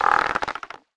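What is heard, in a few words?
crossbow bolt back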